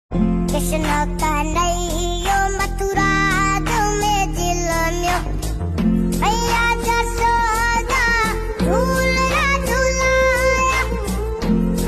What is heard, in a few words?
Ringtones